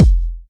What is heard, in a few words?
kick drum sample f key